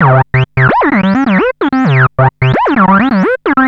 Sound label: Analoop, Industrial Analog